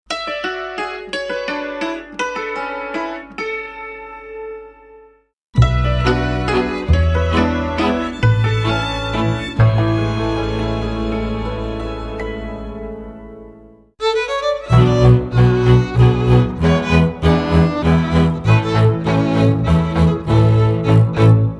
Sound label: nokia tune orchestra, ringtones